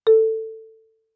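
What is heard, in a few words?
Marimba yarn fortissimo A4; MIS Pitches - 2014; Percussion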